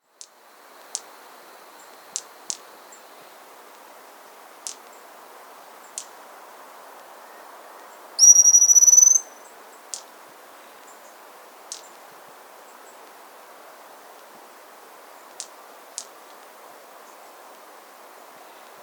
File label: Hazel-Fronted Pygmy-Tyrant (Pseudotriccus Simplex), Andean Birds; S. America